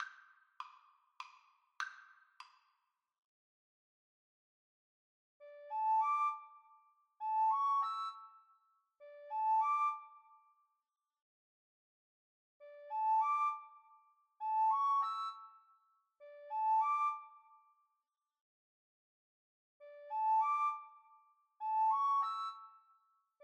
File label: fur elise, Recorder Duet